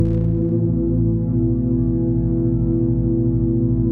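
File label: 122 BPM Drones A major F minor Tonic A; mmb studio soundtrack essentials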